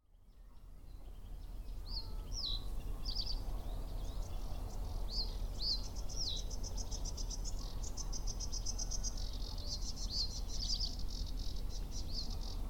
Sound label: corner pools cuckoo frogs bunting